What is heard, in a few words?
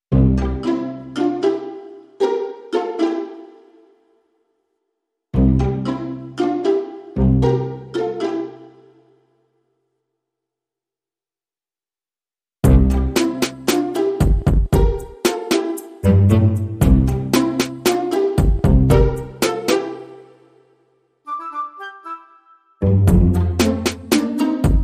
Modern Percussion, No Interjecctions